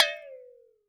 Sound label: WATERBEL, WATERBELL; Univers Sons - Raricussions